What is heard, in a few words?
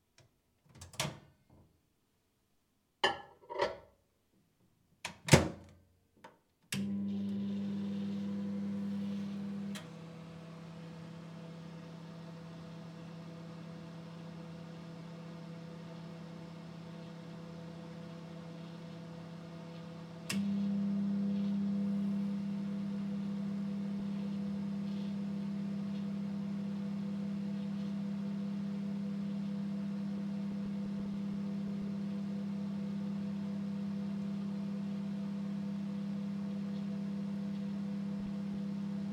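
heating food in the microwave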